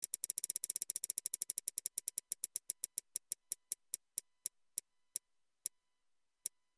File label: wheel